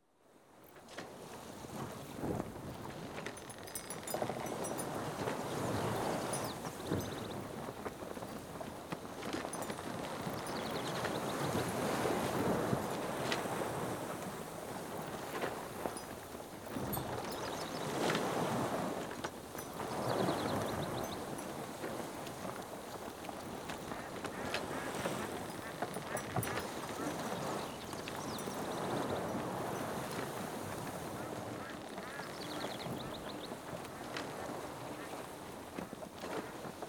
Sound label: sailboat on lake